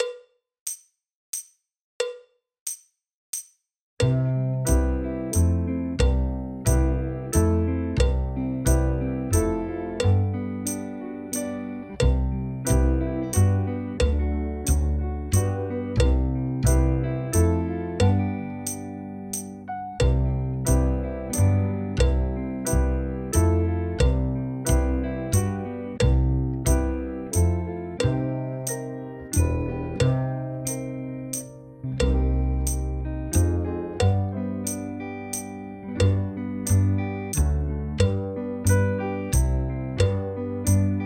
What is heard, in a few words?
Drum Kit Brush playalong; Grade One